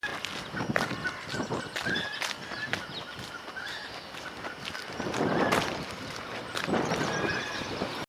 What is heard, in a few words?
Walking and Animals; Aylesbury trip